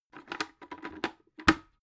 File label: bucket handle use pop